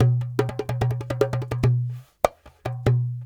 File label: Dumbek